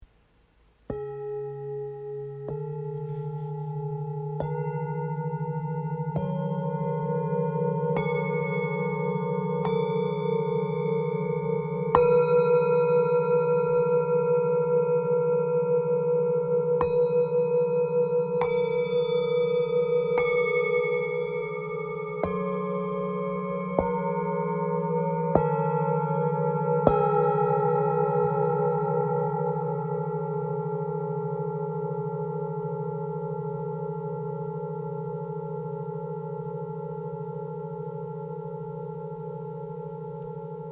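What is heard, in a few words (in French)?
Set bol bengale full moon, Bol chantant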